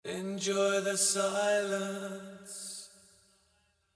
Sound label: Voc end bassline; Multitrack